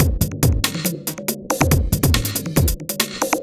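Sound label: industrial 140 kit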